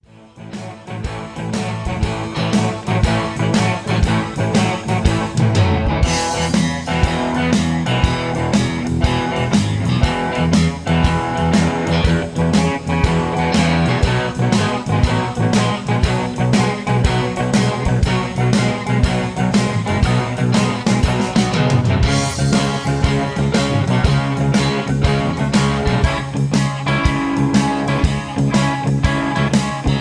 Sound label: I V VI IV Texas Boogie E 120